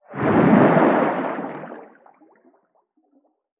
Underwater Water Splash Dive Movement

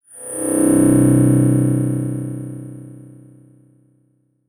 Transition Delay Medium; Adobe; Production Element

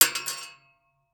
Weapon Bullet Shell 44 Dropping On Metal; AdobeCC

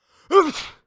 Human Male Sneeze

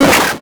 Multimedia Internet CD-Rom Flash Short

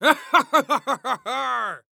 Human Pirate Laugh

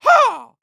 Human Male Karate Yell Ha

